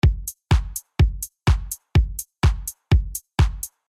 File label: DRUMS Bass Dry